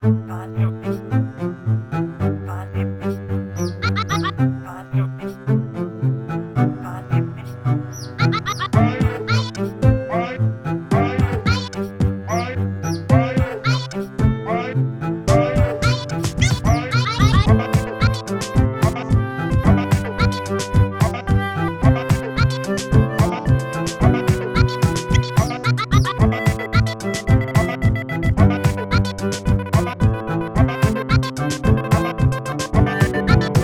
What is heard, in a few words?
bassy